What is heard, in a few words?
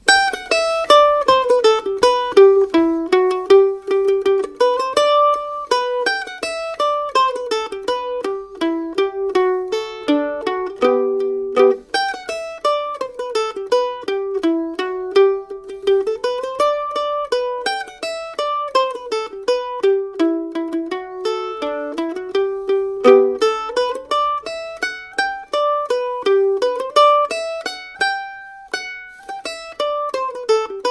mandolin; girl i left behind